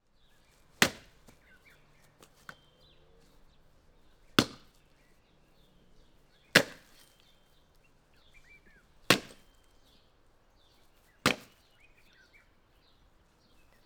Chopping A Tree Trunk With An Axe

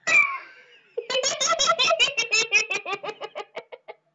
Child laugh

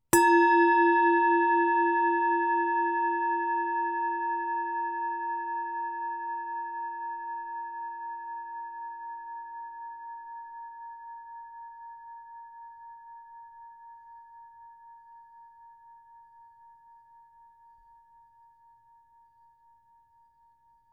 Bowl

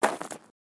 Left Gravel Footstep

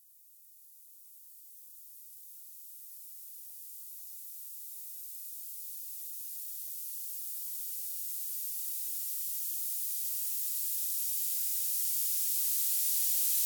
Stereo Noise Experiment

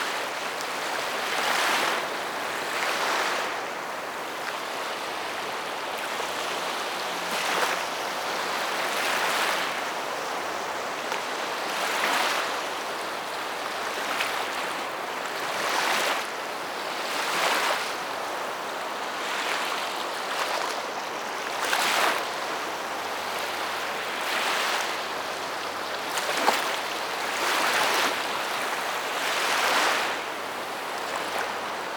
Waves

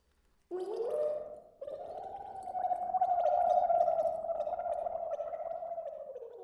Drowning Girl

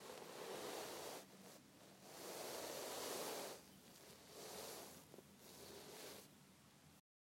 Body Dragged On Floor

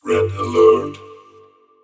Red Alert

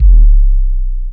Sub Percussion

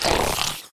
Pig grunt

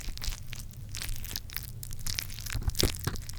Squish

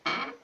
Wood door opening

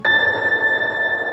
Surreal Bell

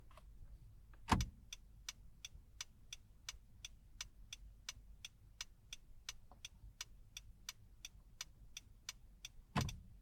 Car Turn Signal

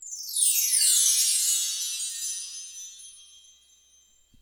Chimes Gliss Down

